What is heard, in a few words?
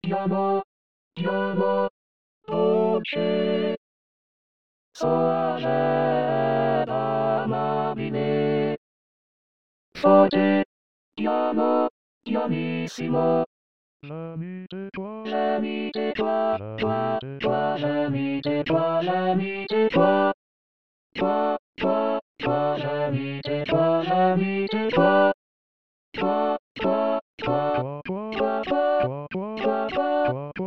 tutti